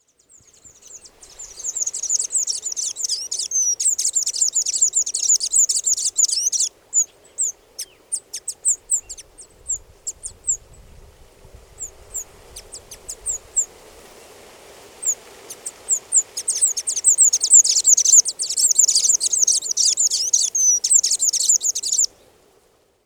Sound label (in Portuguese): saira amarela